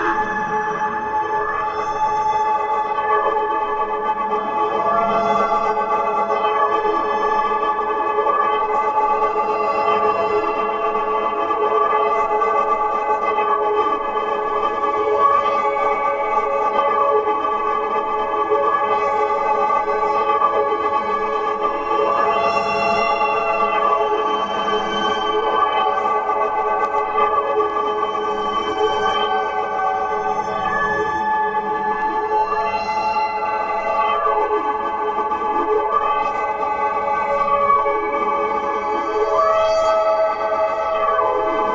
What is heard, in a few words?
Melody Harp